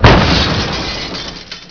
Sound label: glass Shatter; blobAndConquer; combat